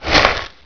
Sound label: sand tap